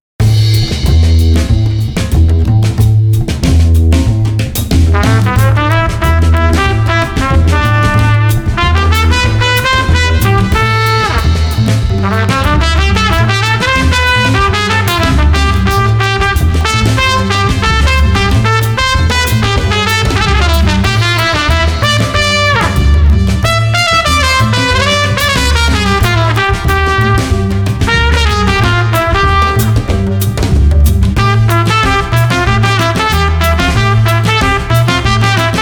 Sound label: Street Trumpet Funky Combo 93